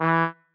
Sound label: marimba trombone; ゆめ2っき